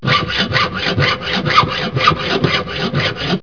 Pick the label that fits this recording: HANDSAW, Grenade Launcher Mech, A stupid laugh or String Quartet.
HANDSAW